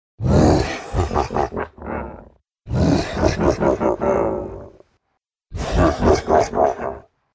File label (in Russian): zvuk smeha orka